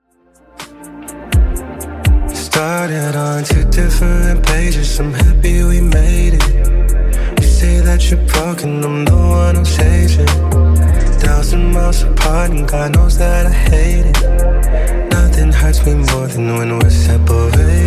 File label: Top40 Redrum 124bpm